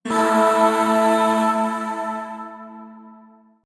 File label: Void Shawzin Chromatic Chord; Warframe; Zariman Void Shawzin